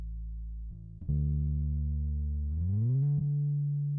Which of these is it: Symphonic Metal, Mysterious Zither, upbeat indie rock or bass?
bass